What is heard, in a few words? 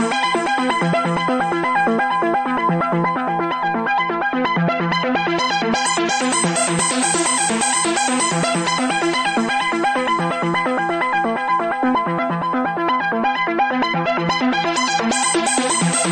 128 two way trance; SYNTH AND ELECTRONIC LOOPS